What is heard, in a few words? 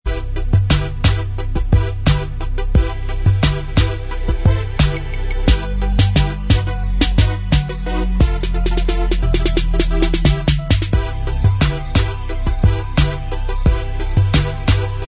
Inst Track